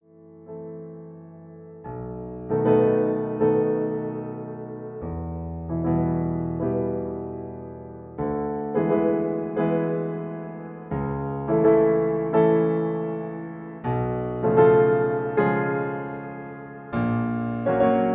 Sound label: Accompaniment E